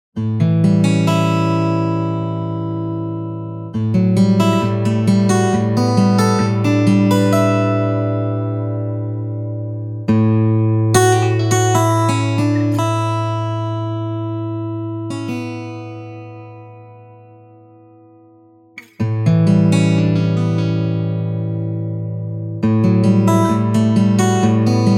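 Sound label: acoustic guitar steel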